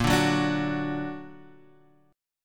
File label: Bb Augmented Bb down Guitar Half Step Down